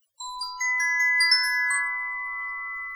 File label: denoising glock 0dB mmse